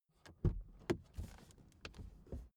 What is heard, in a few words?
suv open door soft